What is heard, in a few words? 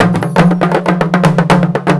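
120 COMBO, drum; Best Service Advanced Media Trax Modern Composer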